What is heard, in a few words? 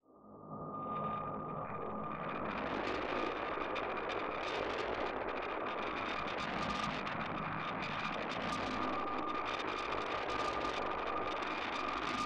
Magnetic Field; 99Sounds; Magnetic Fields